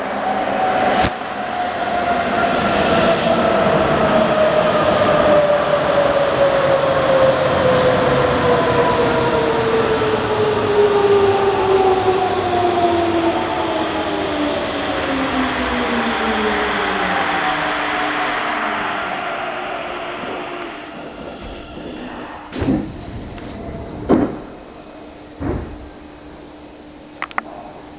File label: mitsubishi B gensoku, train motor sound